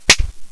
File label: clap